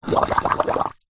water in metal container short bubble blow hard airy through plastic; foley